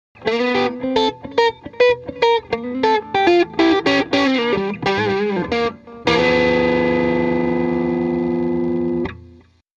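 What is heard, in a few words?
m75 lp mid pickup light overdrive jtm45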